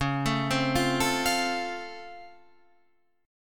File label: Db Augmented Major 7th, down, Guitar, Drop D